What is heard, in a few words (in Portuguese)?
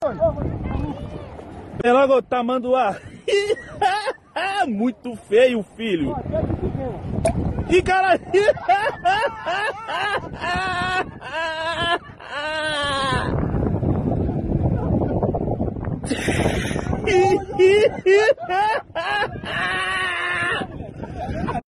se liga na risada